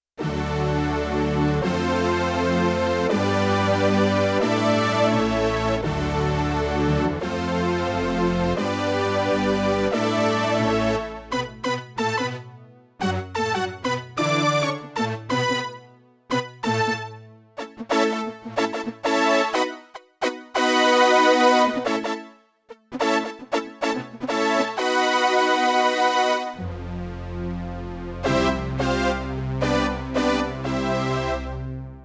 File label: Brass + Strings, Yamaha TX16W; Synth Set